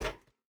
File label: footstep burlap metal run; Rust